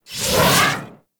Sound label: Radial Javelin Sword Cast; Warframe; Excalibur Umbra